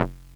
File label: bass stabs, Drum Machines FutureRetro FR777